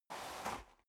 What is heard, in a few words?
step boots gravel light scuff; schritte